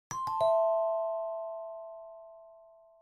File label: message default; Verykool; S700